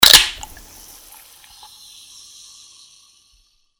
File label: Bell